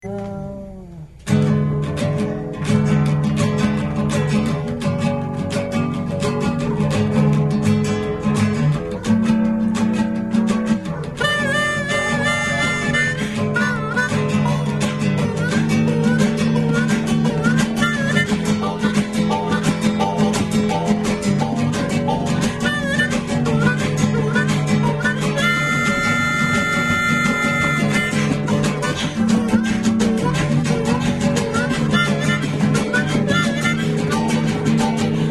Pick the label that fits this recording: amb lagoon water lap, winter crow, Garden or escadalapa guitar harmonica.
escadalapa guitar harmonica